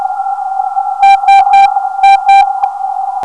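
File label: non-directional beacon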